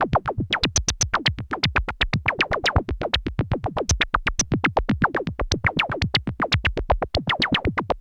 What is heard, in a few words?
noise loop filter efx